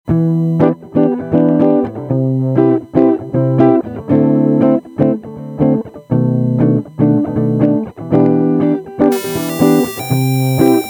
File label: Jazz